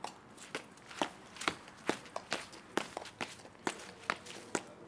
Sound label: Footsteps Heels Concrete